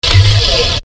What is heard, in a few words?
door; cstrike